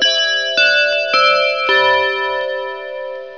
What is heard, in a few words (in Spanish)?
musical